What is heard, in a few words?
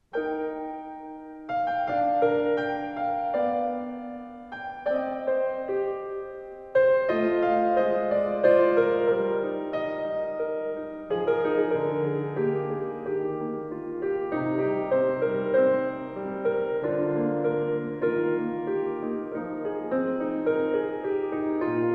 Piano Improvisation